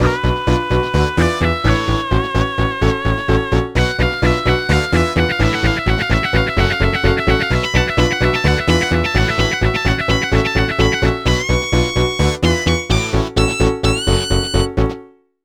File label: Rock And Roll